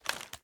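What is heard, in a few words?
assault rifle reload empty fast extended magazine rotate; weapons; gmod; viper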